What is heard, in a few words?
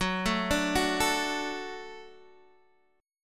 Gb Minor Sharp 5th Gb Guitar Open D